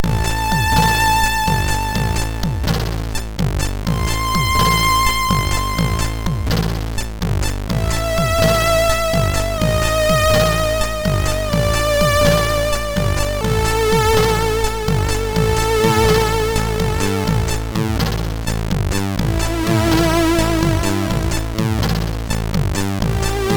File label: Delay, MOS6581R3; sid